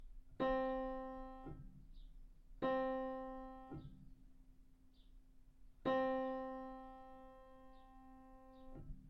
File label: rhythm 8beat, violin; Rhythm Train Book 2